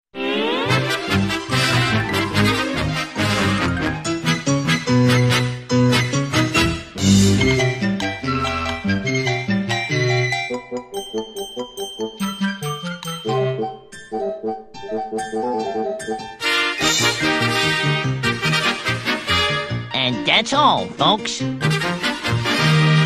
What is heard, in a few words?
bugs bunny cartoon, موسیقی انیمیشن; باگز بانی